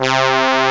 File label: trumpet mod; orchestra, brass